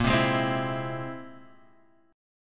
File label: C Augmented 7th A sharp